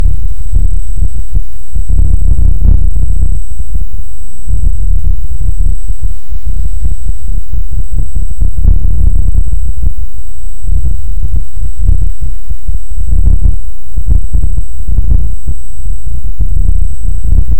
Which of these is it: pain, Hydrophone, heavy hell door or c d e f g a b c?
Hydrophone